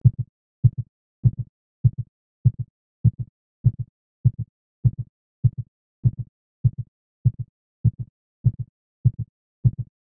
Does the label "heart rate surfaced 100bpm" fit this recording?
Yes